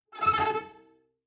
Cello Shivering Tremolo; SoundsCrate

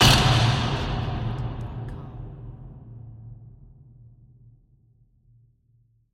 designer full impact with rattle hit

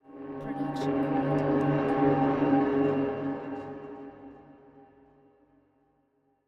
sci fi gleam; soundscrate

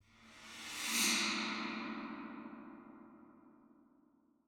Gong Swipe; SoundsCrate

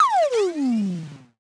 Laser Charge Down; SoundsCrate

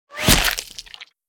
body bullet hit

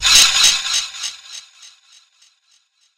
horror hit rattling

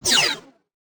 scifi weapon laser; soundscrate

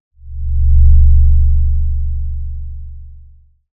large long rumble fly by; soundscrate

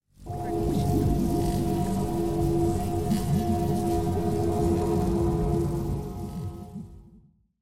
magic resonance; soundscrate

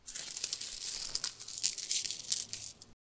coins rustling

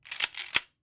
Shotgun Racking; SoundsCrate

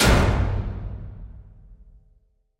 Scary Big Hit; SoundsCrate

